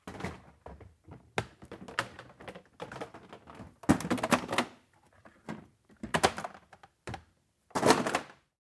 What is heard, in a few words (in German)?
Kiste